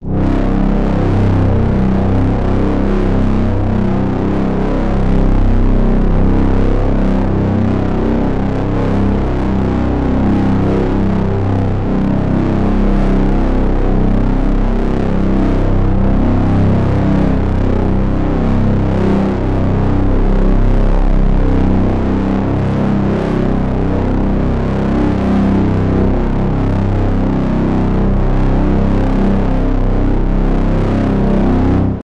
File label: synth drone